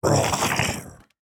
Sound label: evil salamander, monsters; GGJ22